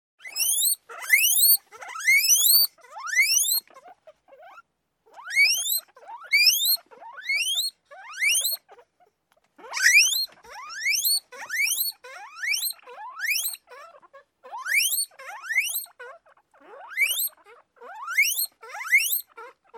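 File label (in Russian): морская свинка делает острый звонок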